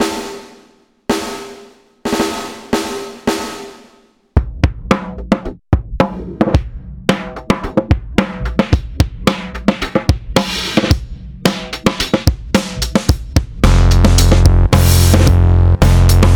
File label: Bombstep backing 110bpm; Backing Full Tempo